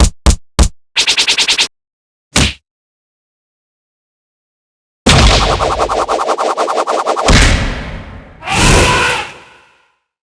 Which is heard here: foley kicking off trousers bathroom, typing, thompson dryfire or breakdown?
breakdown